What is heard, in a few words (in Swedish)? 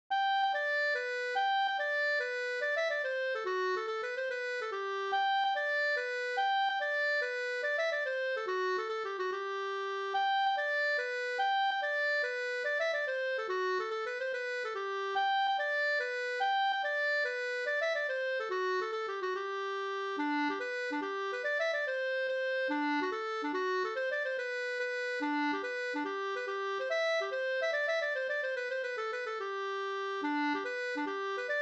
mazurka; Lagårdsmazurka komponerad 1975 av munspelaren Håkan Larsson, Torsby, Värmland